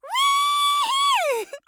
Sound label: Mage Skydive; Realm Royale